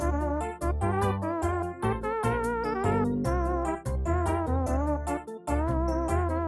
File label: Elevator Jingle Loop; Lethal Company